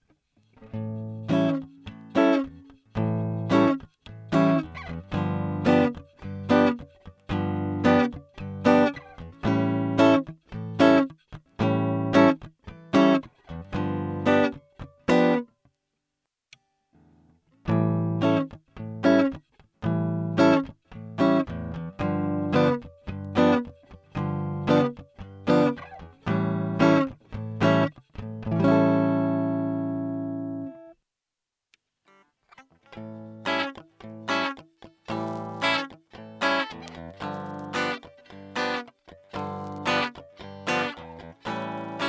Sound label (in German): single PU Kombis, Elektroakustik Telecaster